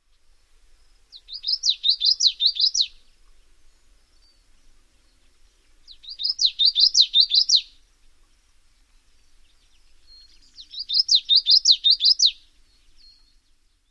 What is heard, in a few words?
songs